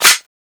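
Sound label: weapon foley usp reload clip in; Call of Duty Modern Warfare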